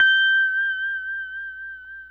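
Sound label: pedal steel G6 left; PEDSTL NOTES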